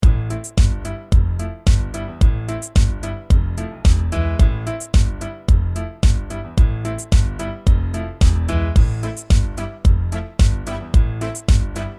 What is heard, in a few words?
Smooth Nu Jazz